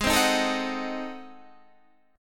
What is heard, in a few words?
Ab 7th Flat 9th Guitar Standard, chord; Ab7b9 strummed; JGuitar